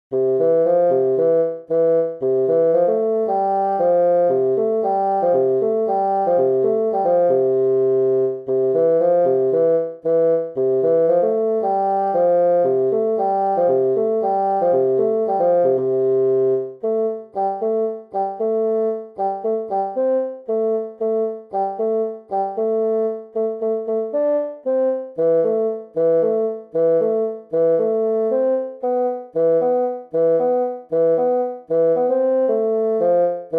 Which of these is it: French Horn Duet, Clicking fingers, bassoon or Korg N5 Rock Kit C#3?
bassoon